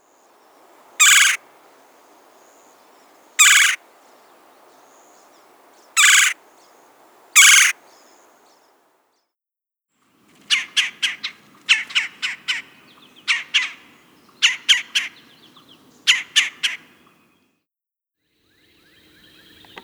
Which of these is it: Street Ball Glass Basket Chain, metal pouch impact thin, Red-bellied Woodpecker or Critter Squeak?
Red-bellied Woodpecker